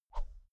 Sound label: whoosh thin slow soft; swooshes-swishes